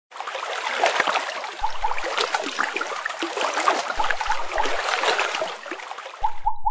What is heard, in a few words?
Water videoringtone; Nokia Ringtones; Audio themes